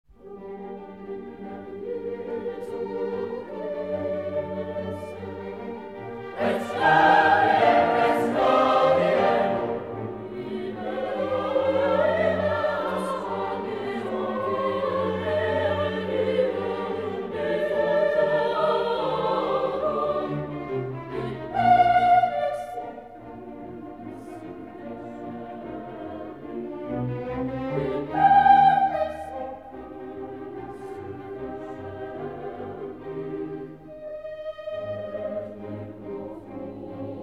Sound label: Requiem in D Minor, K 626 IX. Offertorium Domine Jesu Christe; Mozart, Wolfgang Amadeus